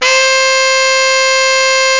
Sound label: sax (c4), brass; instmnts